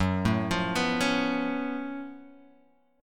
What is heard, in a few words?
Gb Minor Major 11th Gb Guitar Open G; 4,2,3,4,2,x; down; chord shape